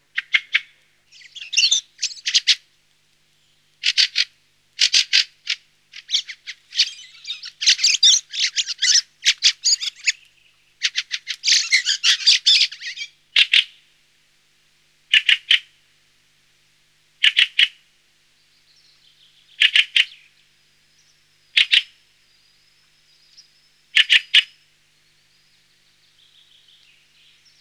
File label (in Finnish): Räkättirastas; lintuja; nauhalaisen aanimaailmat